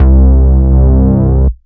Ultimate Bass Root C; Vengeance Samples

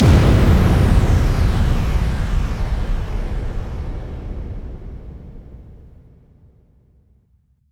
Bassdrums FX, FX Kicks; Vengeance Samples

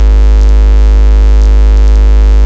Ultimate Bass G# Root G#; Vengeance Samples

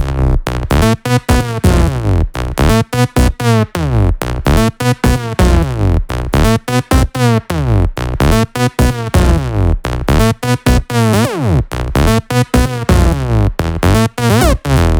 128BPM Melody; Vengeance Samples; Capone Kit

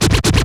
Vinyl FX and Scratches, FX Scratch

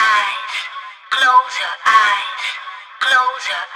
House Loops, Vocal Loops House 128BPM

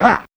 Percussive FX; Vengeance Samples; VEH1 Percussion

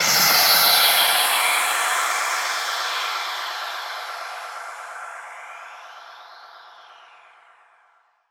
FX Downlifter

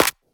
Clap; Vengeance Samples

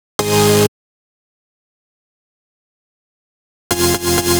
128BPM Cutted; Vengeance Samples; Guess What Kit

